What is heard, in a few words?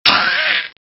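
zombie hurt banshee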